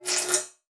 Multimedia Internet CD-Rom Flash Pop Up Electronic